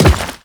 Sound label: Step Rock, Footsteps; Warframe; The New War; Characters; Kahl